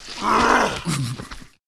zombie attack

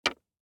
DICE on Hard Wood, Drop, Standard, 3 Three Dice; Samples; ArticulatedSounds; DICE Sfx Party (Extended)

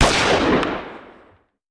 Hand Gun; Sound Ideas; Guns

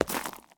zombie footstep concrete; DeadFright

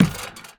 Street Ball Acrylic Back, Street Basketball; SFX Kit

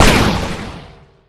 Gun Sniper Rifle Shot; SFX Kit; Weapons

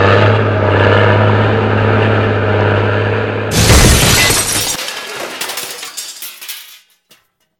destroy; Quentin C's Gameroom

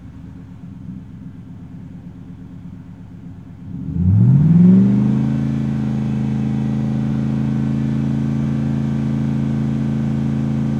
99 Mustang Fast Idle, Automobiles

